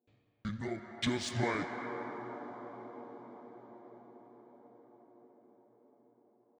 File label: fx No Halo